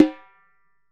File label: Repique Drumhead; Best Service; Festa Latina VOL-1; Single-Hits